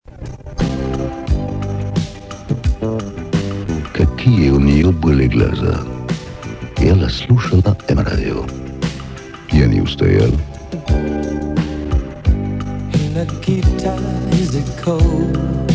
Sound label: jingle, radio; m radio